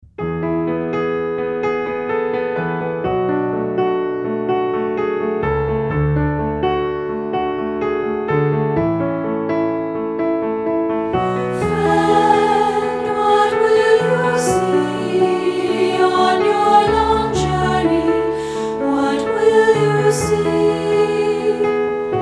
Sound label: SATB